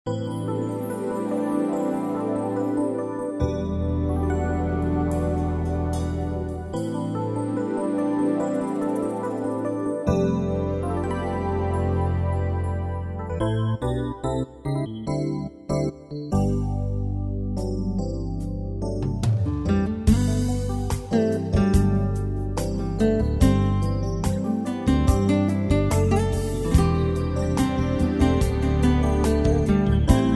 Electric Piano

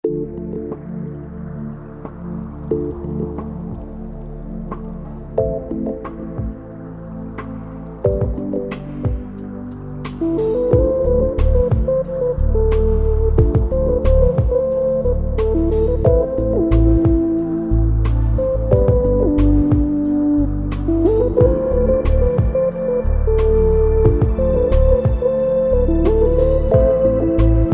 Style New Age

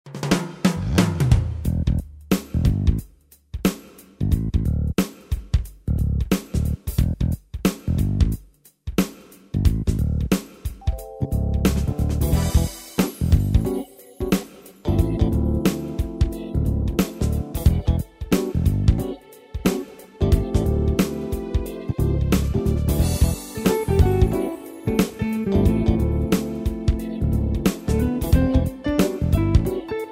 Style Jazzy

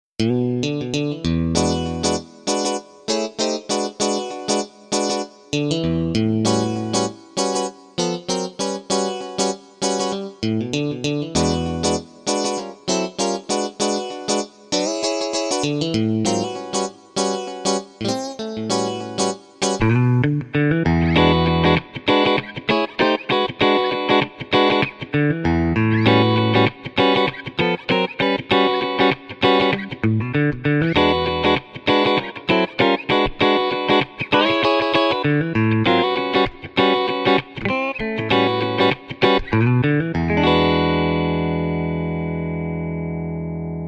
Megavoice Solid Guitar

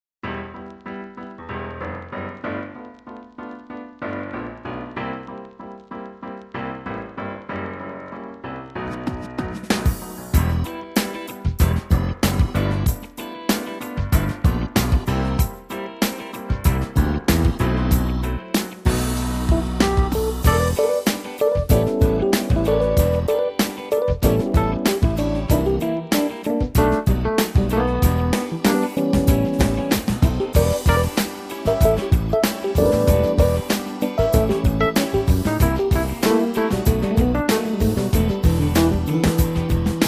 Funk Pop Rock

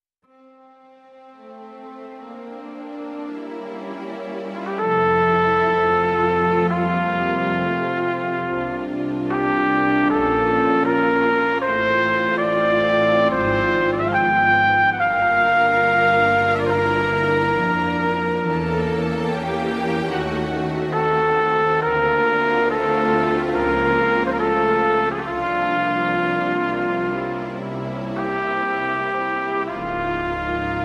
String Cornet

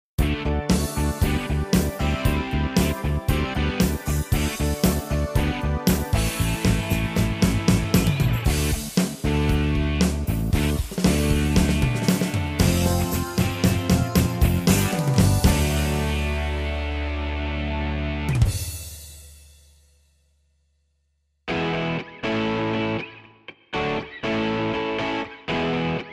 Style Hard Rock

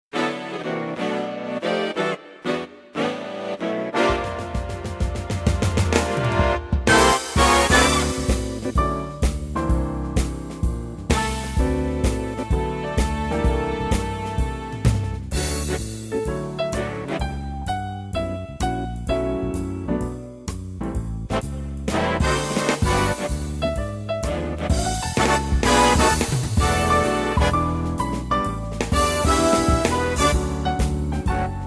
Style Modern Big Band

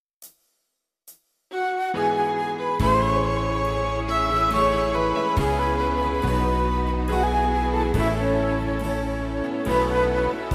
Style - Irish Hymn

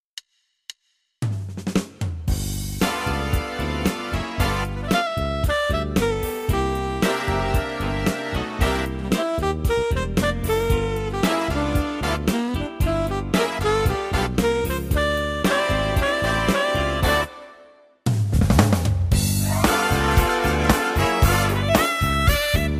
Megavoice Ensemble

